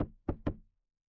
impact wood knock

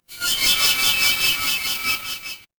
magic scifi alien

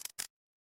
mechanical reload

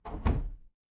door close

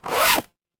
paper fabric zipper

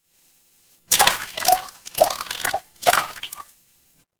water splash

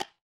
instrument wood block

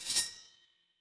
impact sword

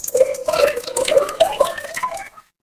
water pour

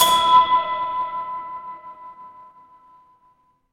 instrument bell

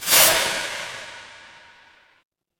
magic scifi portal

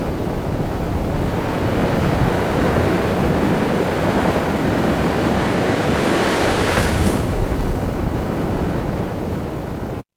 ambience ocean

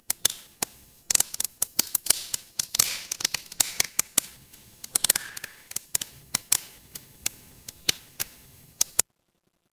ambience fireplace

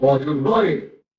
ui low battery

